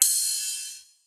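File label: Open hi-hat; Trance; Zero-G Trance Inducer; 90 s Sample CDs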